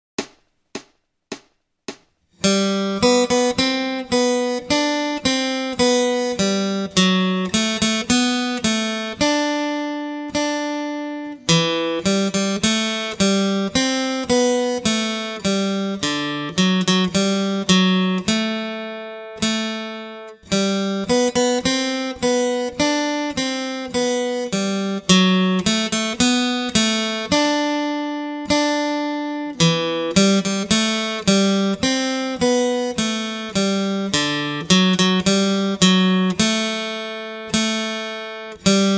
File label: Acoustic Accompaniment, acoustic guitar